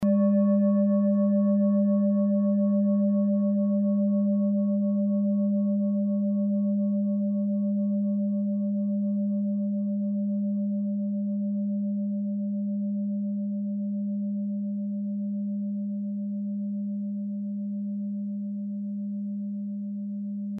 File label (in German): klangschale set; Klangschalen-Chakraset; Gewicht = 1120g; Durchmesser = 19,3cm; Höhe = 9,5cm